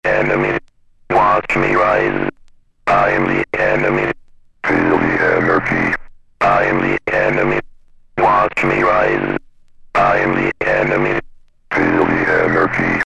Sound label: acapella